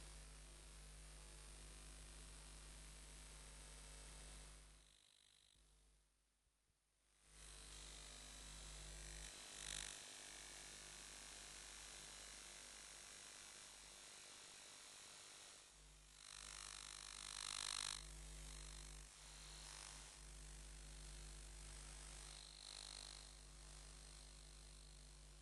lamps source neon tube fluorescent house basement light bulbs electromagnetic; elektrousi axl; Sonniss GDC